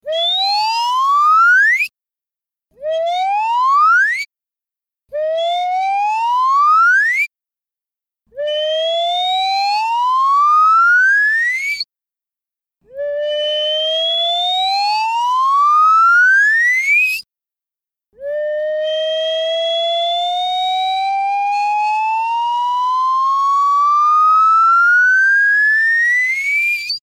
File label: comical up